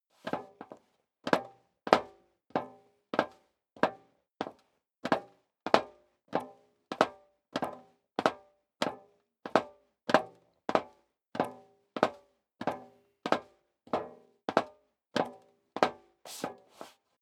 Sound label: step leather shoes metal dry downstairs sequence; schritte